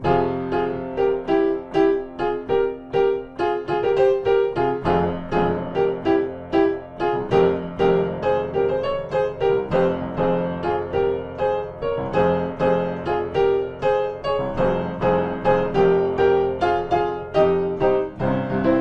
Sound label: Piano